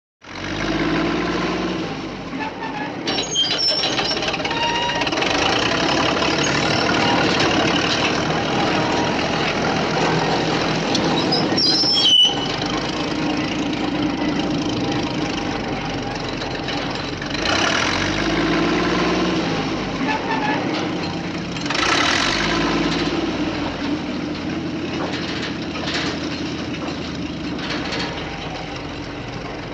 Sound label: Bulldozer Crane; Heavy Engine Revs And Maneuvers With Piercing Metal Squeaks And Metal Bangs.